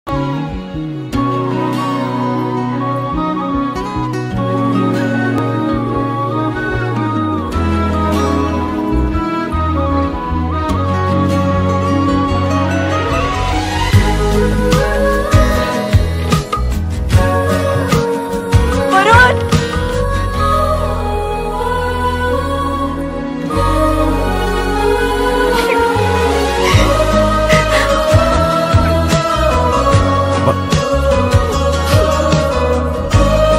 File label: sad bgm sad ringtone; fida movie